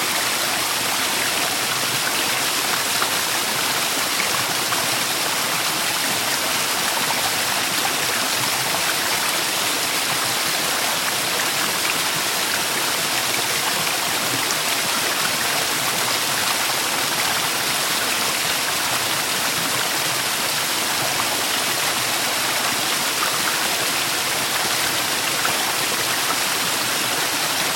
burnij potok vodi

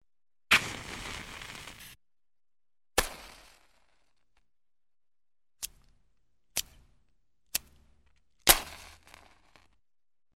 zvuki zazhiganiya spichek

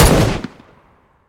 odinochnij uverennij vistrel s ehom

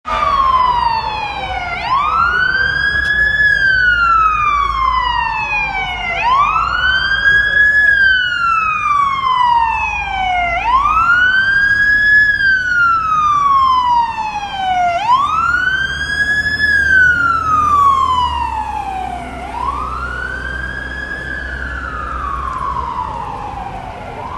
sirena policii

